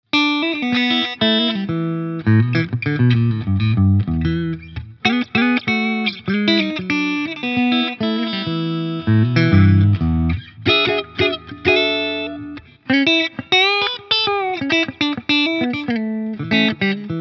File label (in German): Yamaha Revstar RSS02T Mitte clean